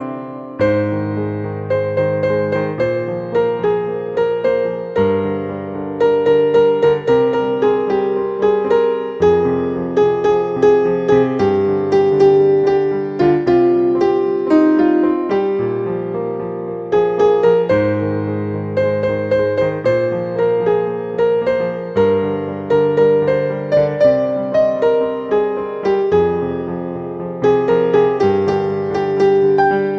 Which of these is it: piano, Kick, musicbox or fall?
piano